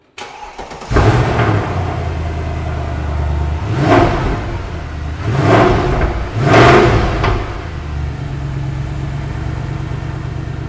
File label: startup